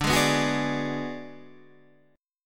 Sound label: D 6th Flat 5th D down Guitar Standard, chord; D6b5 strummed; JGuitar